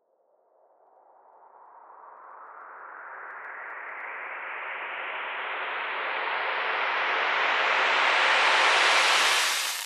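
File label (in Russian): вжух шумный близкий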